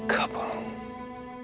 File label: kaboom; Caprica; End of Line